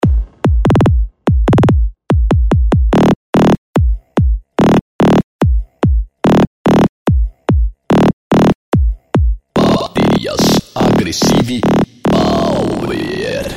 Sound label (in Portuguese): Baterias, som automotivo; Baterias Agressive Power Vol.02; som automotivo raiz; Open Format